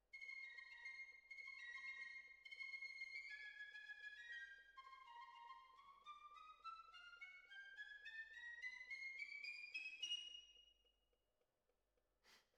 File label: Violas, jazz for piano